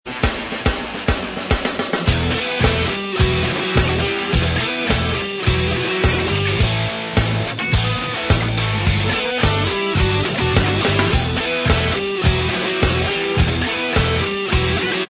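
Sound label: instrumental, backing track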